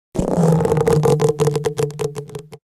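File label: rubber stretch, funny cartoon sounds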